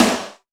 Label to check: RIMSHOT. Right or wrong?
Right